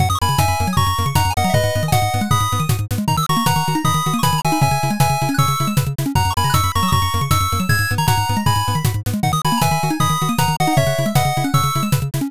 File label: bgm drive